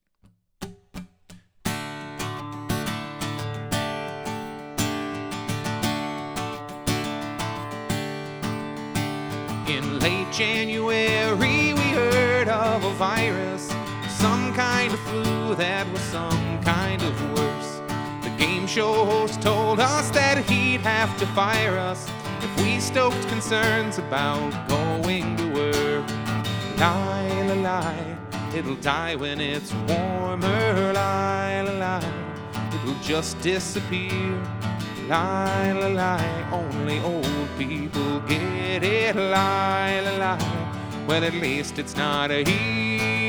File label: Backing Track; No Conventions This Year